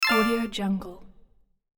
interface sci-fi accept short; interface sounds

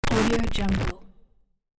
glitch malfunction short; futuristic; قطع ارتباط رادیویی

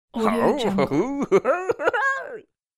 psycho male laugh; human sounds

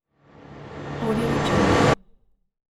transition industrial thick low; transitions and movement; ترنزیشن صنعتی با توقف

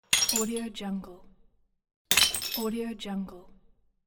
tea cup break; domestic sounds

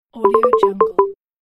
app interface sound smooth tech

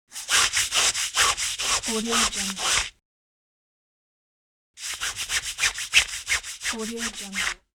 scratching; خاراندن از روی لباس

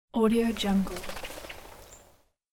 woodpecker flying away

